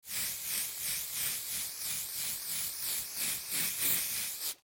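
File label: sounds of hairspray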